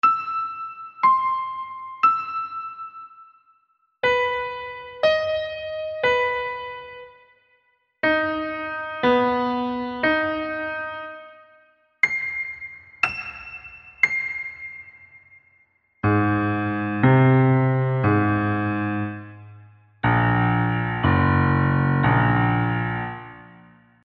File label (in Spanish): Ejercicios de Intervalos de tercera mayor de manera consecutiva ascendente y descendente